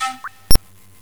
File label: breath noise